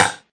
breathy clap, drums single hits; Claps

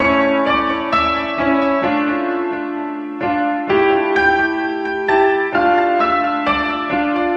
065 piano a minor; melodic loops; synth and electronic loops

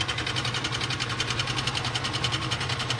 160 bad gasket loop, drum loops and breaks; 141 to 160 bpm

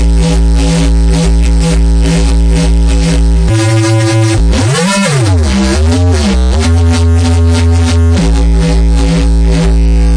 gnarly overloaded bass for sampling; Basses

